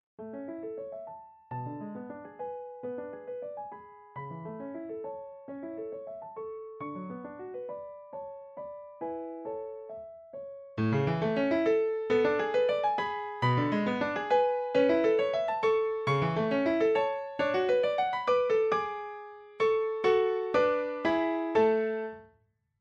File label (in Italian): Allegro Per Pianoforte; Kafka; Ludwig van Beethoven